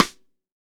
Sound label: rim shot